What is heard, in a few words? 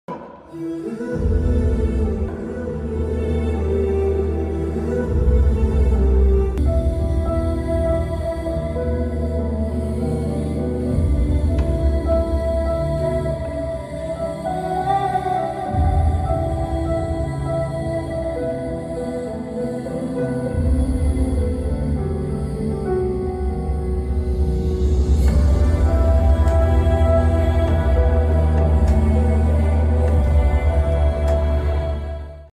climax bgm sad bgm ringtone; the family star movie